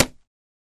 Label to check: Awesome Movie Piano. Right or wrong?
Wrong